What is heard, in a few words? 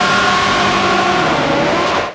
Kaos FX, Ambient Grooves